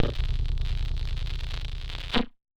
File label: Casio, Glitched, malfunctioning synth